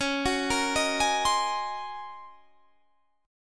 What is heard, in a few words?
Eb Minor 13th Db